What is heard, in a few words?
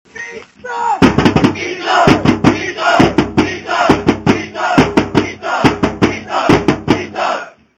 call